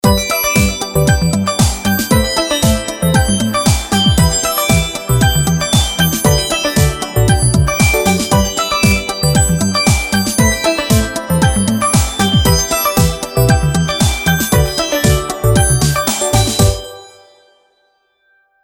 Sprinkles; ZTE ZW20 Verizon Gizmowatch 2

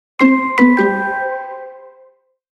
Message Alert; Swisscom

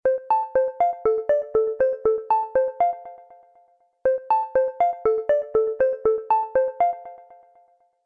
Walking, alarms

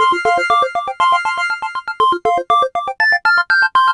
Schedule tone; LG B470

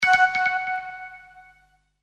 New Message; Glow; Sony Ericsson; P900

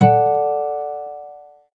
Harmonics, notifications; LG G3 S

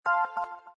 E Piano On

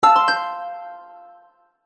Plucked Calendar, Harp; HTC; Harp 1

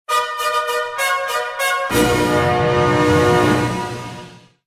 Royal Horn, notifications; Huawei C8813D

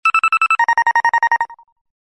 Bee-Beep; LG MT375 Lyric

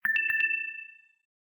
Low Battery

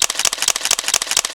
continuous shot; LG Optimus F3 MS659

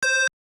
dial tone; OnePlus One China

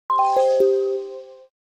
Recharge battery; Nokia N85; Audio themes

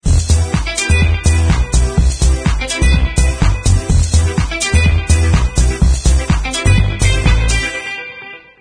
game over

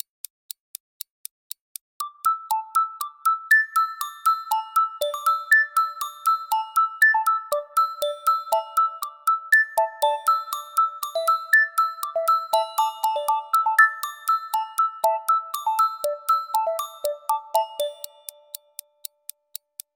alarms; LG; Smart Folder X100S; White Rabbit

